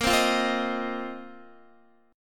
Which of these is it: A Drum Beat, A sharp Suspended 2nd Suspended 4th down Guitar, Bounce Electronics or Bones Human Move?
A sharp Suspended 2nd Suspended 4th down Guitar